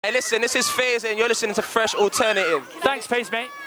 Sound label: Jingle